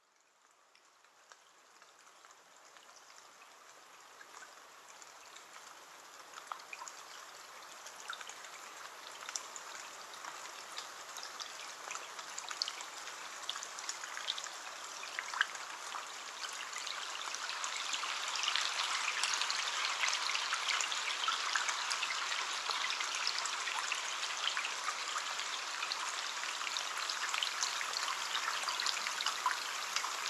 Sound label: Rain underwater at surface onset subside; boom library; Thunder And Rain